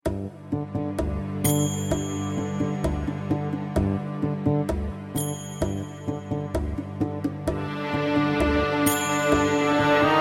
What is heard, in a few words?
Arabian